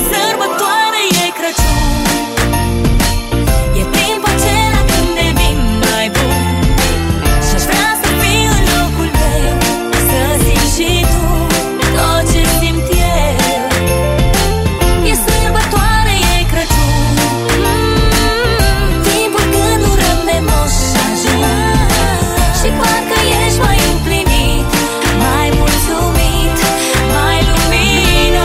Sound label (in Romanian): Lavinia de craciun